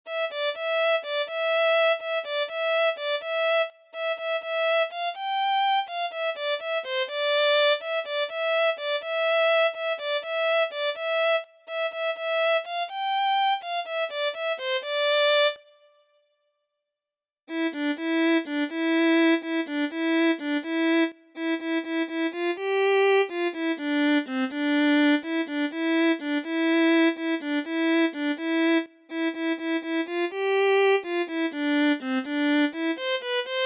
Violin

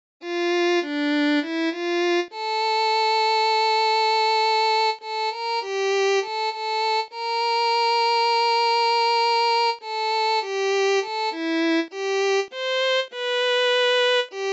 Violin

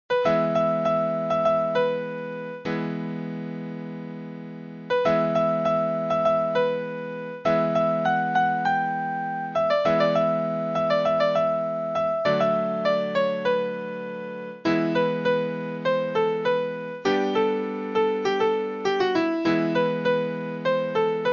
Piano

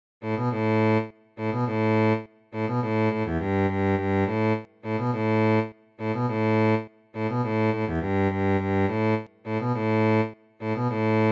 Cello

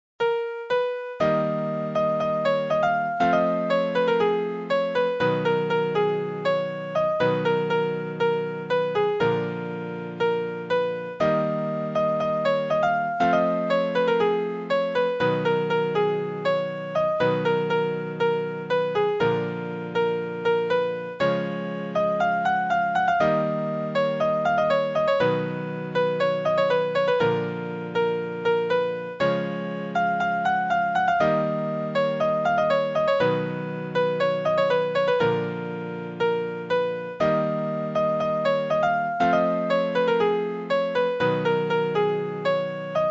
Piano

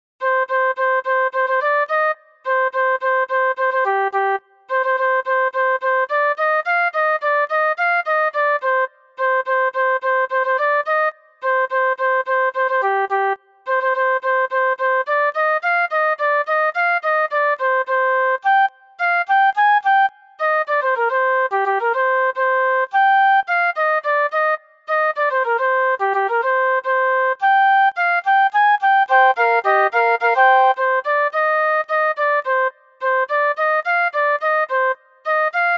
Flute

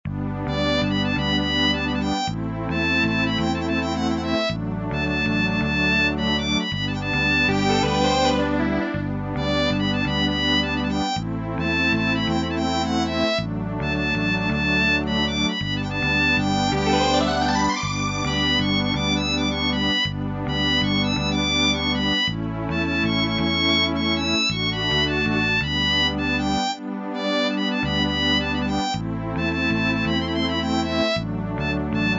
Violin

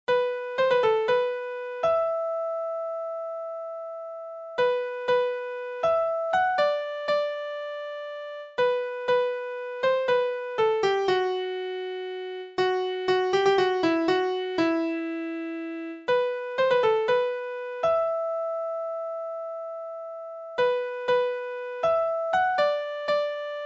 Violin

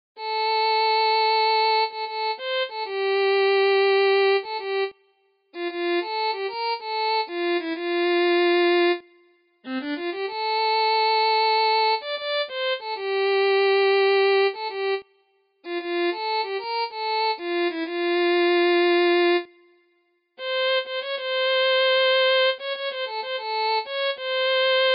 Violin

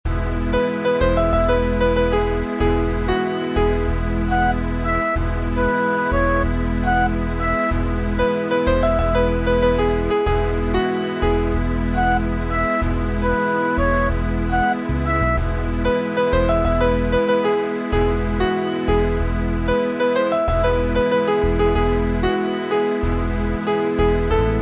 Piano

